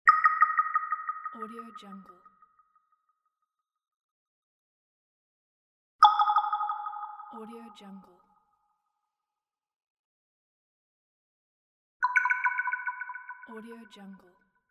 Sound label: water drop